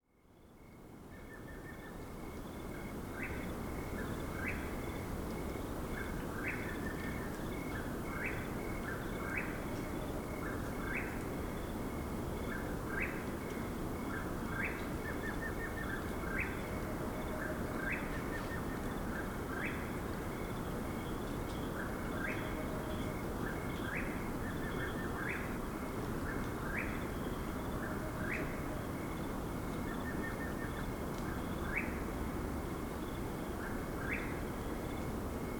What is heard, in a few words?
Midnight Breeze Atmospheric Surround